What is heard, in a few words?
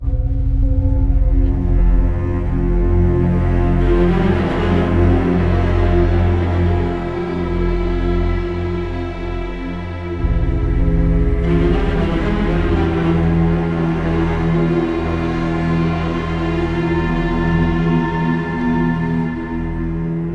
Suspense Oneshot